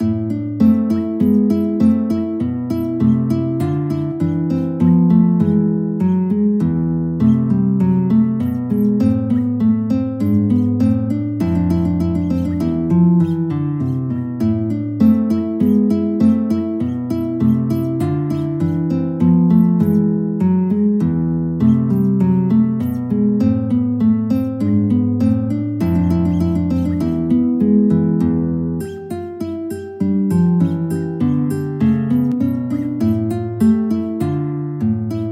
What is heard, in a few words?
Menuet A minor, guitar